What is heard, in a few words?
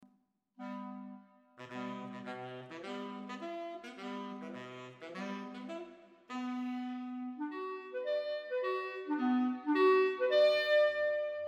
sax Bcl